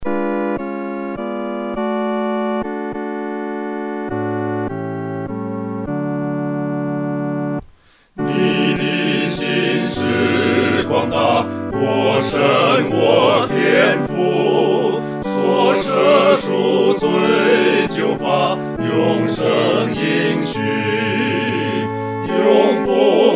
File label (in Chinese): 合唱（四声部）